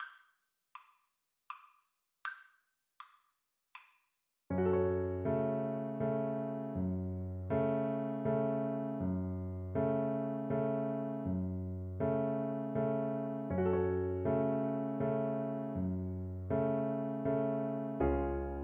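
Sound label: viola karaoke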